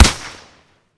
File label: vector silence; emx umx32